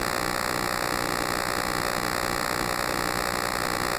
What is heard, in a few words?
toaster oven lowbit knob return loop